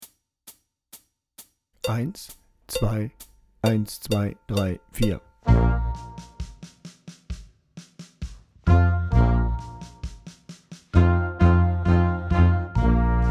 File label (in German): Rock Tuba lauter